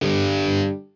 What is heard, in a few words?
electric guitar